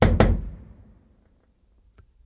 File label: Staff Hit Single, spears & staffs